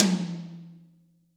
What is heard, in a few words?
AMB TOM; DRY+AMB TOM